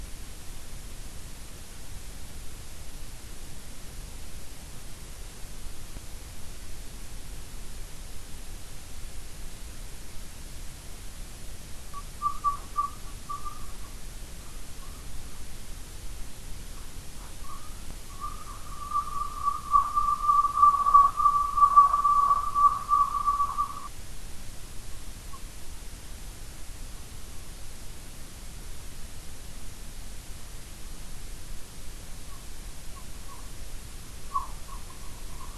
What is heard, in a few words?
WFR audio emfisis